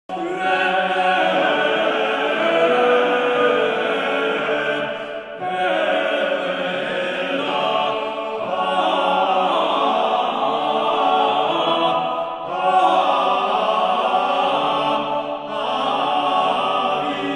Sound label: organum quadruplum; Perotinus; Viderunt omnes